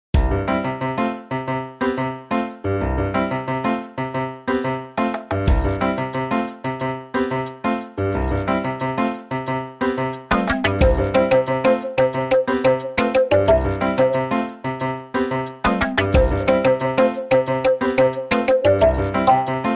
Multiplayer - Character Selection; New Super Mario Bros. 2; 3DS

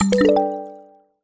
Collectables Potion Large

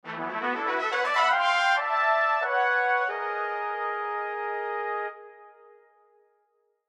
Level Done Jingle; Gods Remastered; Gods Level Done Jingle 1

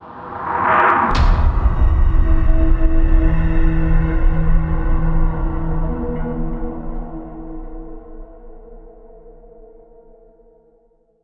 Round End; Buried; Ghost

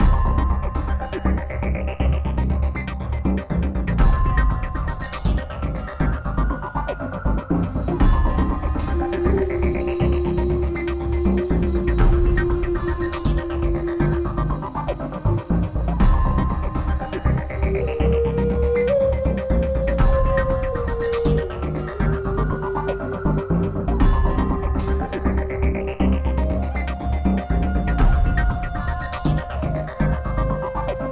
Nonbattle; Stage02; thedawn

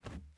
plastic jump

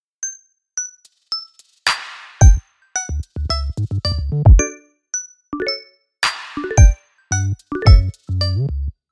Tutorial (110 Bpm)

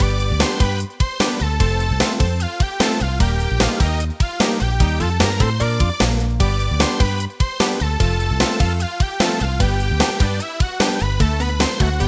Dungeon; Doraemon Nobita no Nankyoku Kachikochi Daibouken; 3DS

